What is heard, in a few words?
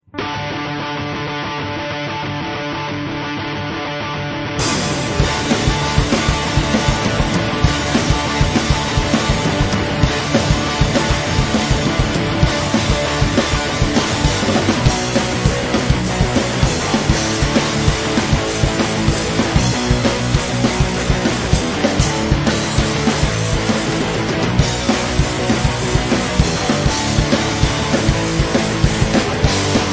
(instrumental)